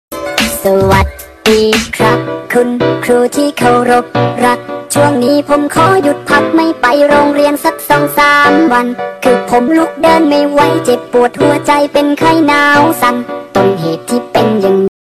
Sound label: remix